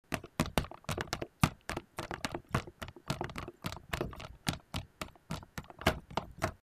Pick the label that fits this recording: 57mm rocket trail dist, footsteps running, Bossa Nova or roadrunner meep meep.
footsteps running